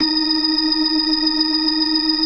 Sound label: FAST VIB, TREMELO+VIB, Synth and Organ